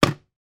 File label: food pack of 4 yogurts put down on hard surface